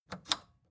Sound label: record player power click off slow